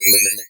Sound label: Multimedia Internet CD-Rom Flash Hi-Tech